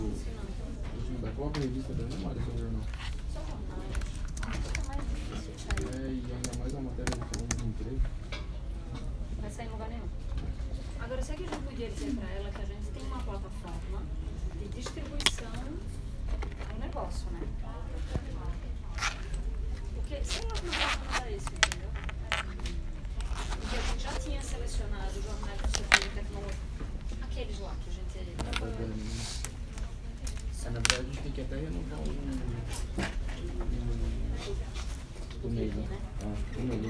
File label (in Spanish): Escritorio